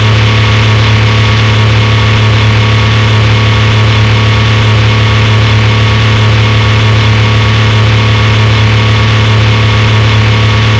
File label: engine